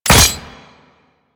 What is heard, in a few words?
Ghoul Sawman Shot End, Attacks; Warframe; Grineer Ghouls